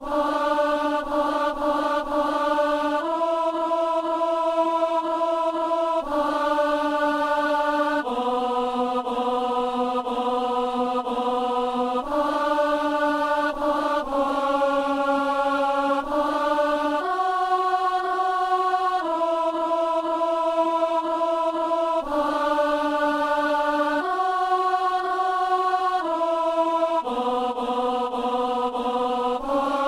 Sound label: alto; Anima Christi; Messe du 17 janvier à Saint-Denis